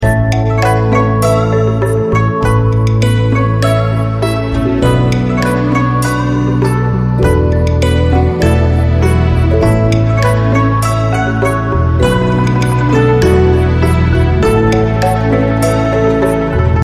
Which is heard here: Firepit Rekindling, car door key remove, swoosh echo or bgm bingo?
bgm bingo